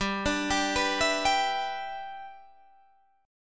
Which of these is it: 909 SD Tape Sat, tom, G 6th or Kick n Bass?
G 6th